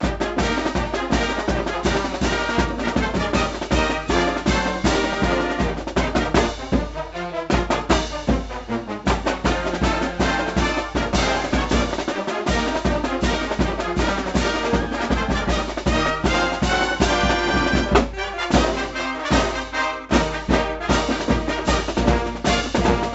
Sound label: Fight Tune; NCAA March Madness 99; PS1